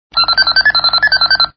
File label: dial